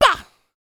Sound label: yell, male human, R&B dance vocal; Spectrasonics Vocal Planet